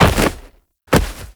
Downed Fall A, Foley; Enemies; Warframe; Teshin; DE

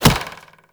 Bow Arrow Impact Wood; Weapon Impacts; Player; Bows; Hunter Bow; Environment; Envoy Hunter Bow

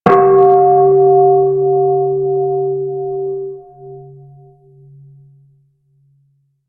Bell; Dojo Props

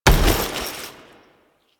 Foley Weapon Fire; Warframe; Kahl

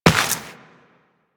Step Dirt, Footsteps Walk; DE; Warframe; Tenno

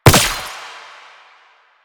Gunbrella Bullet Deflect, Weapons; Warframe; Tenno; Koumei

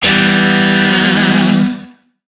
Shawzin Maj Chord C; Music; DE; Warframe; Lizzie Shawzin

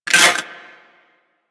Mech, Weapons, Rocket Crossbow; Warframe; Archwing; Arch Prisma Fluctus

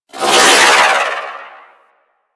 Arch Wing Prime Dodge Roll; Movement; Warframe; Vehicles

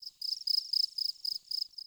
Forest Open Glade Cricket Close, Day; Ambience

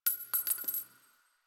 Target Practice Shell Casing; Quincy; Warframe; Quests; Nineteen Ninety Nine

